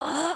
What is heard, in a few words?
stretch, emotes